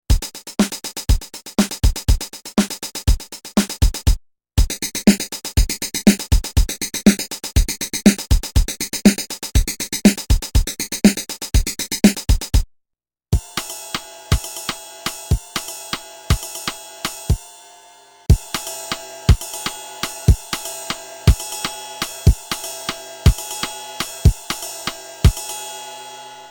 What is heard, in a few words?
TOM Stack